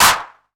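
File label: 808 clap; PERC TR; Wizoo - Platinum 24 Electronic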